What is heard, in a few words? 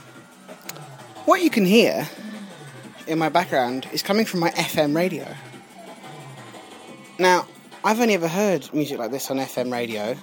drum and bass pirate radio; portsmouth